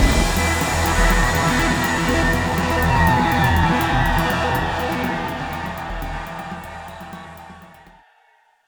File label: Sting; Stronghold3